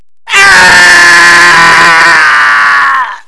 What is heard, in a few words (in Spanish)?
grito hombre; Atomix VirtualDJ 8 Pro